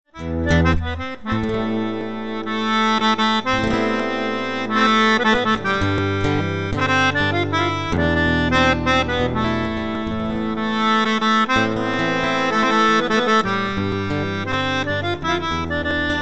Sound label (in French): Bal gavotte